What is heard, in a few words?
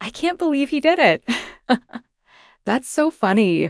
laugh breath